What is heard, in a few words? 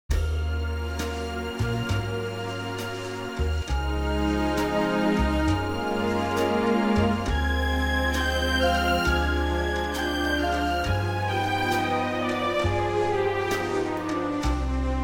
orchestral Bb